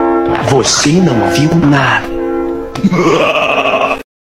muwahahahahaha; voce nao viu nada

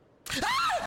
gritin

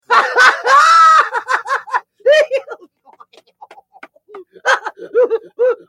risada

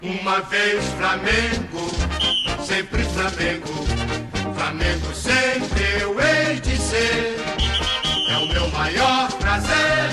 hino flamengo cantado

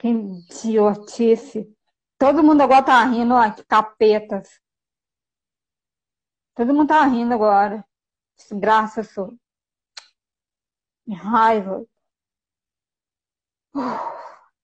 todo mundo rindo

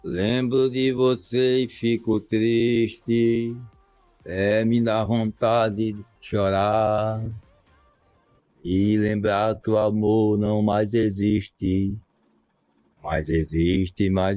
lembro de voce e fico triste cantando

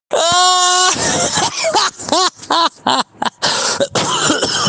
risada

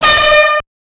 buzina um pouquinho alto

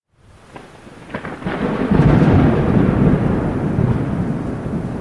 tempestade

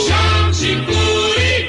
vinheta; jorge cury

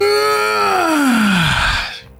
aaaaannnnhhh espreguicando

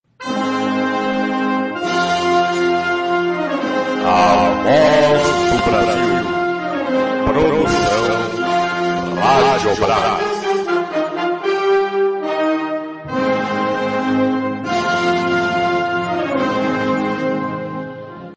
abertura classica do programa Voz do Brasil

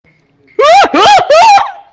risada

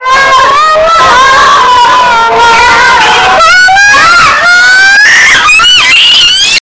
miau miau aaaaaaa

